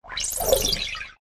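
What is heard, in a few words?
Air Spirit attack up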